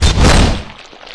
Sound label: zombie stab miss female, claw; tayo escape